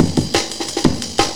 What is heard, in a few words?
amen